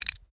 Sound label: rattle, grenade; props